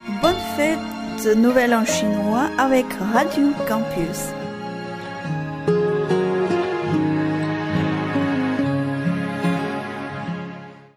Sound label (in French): Jingle Nouvel An Chinois Radio; Ondes de Chine